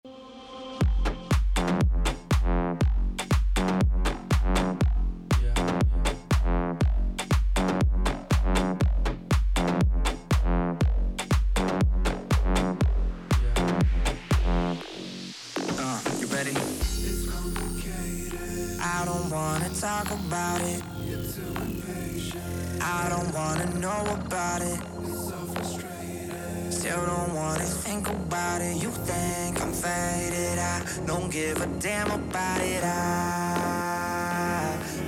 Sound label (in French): Mix Cool Ambiance